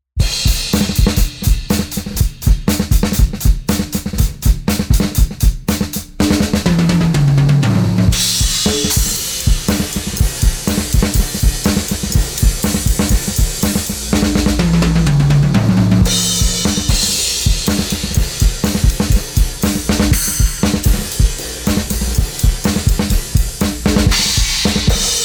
drum all; RECOVER 100

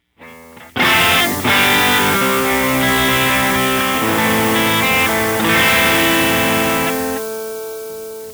NOISE TONE NOTCH; Moogerfooger MF-109S

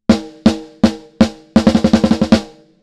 HIGH post Low pass 36dB oct; Kelvin

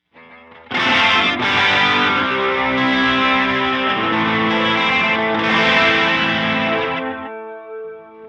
CHORALE DELAY 200.0mS; TriceraChorus

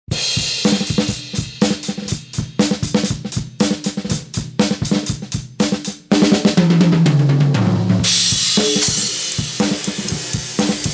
EQ high pass 18dB

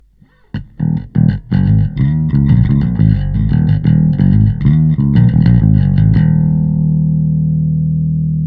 Bass; smart comp; TLA 100A

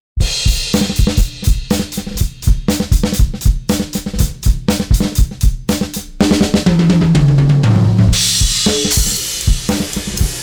drums; Oxygen

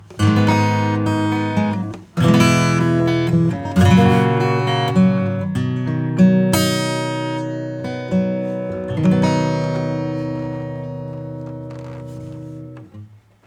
acoustic comp

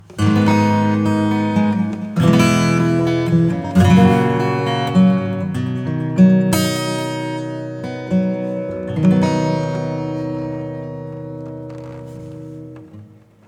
acoustic STEREO Adding Rhythm; GEM Echoson

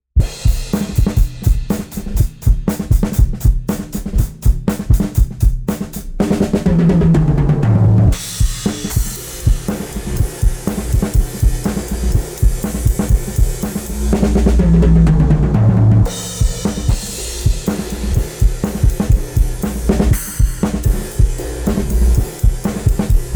TAPE EQ High Mid Cut; TAUPE

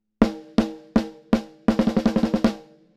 snare Grit; Valves

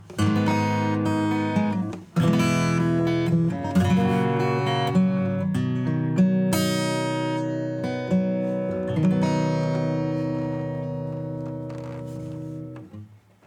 acoustic Guitar Thickner; Xtressor

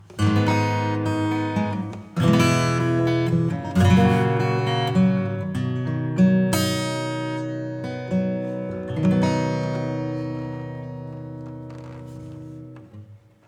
Acoustic Large and Stage; Cinematic Rooms

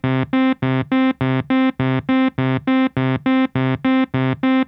Nord Lead 4 arpeggiator

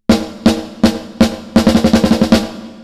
Reverb Compare Snare; Tverb

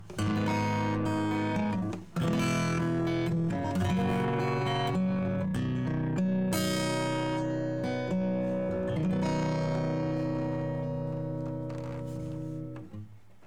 E Gtr

